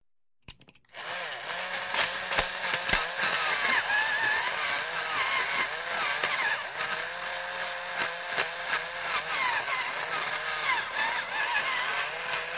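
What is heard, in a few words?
tochilka dlya karandashej elektricheskaya, electric pencil sharpener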